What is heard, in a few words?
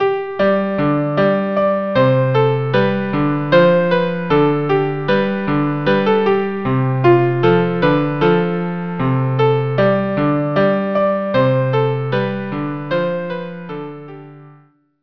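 violin cello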